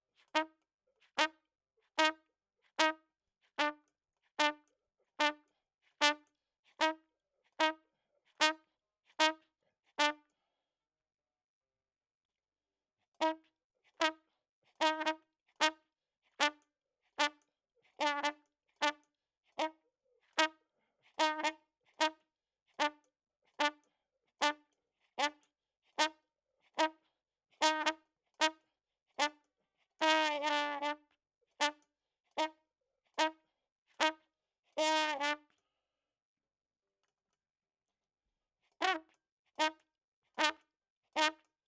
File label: Trump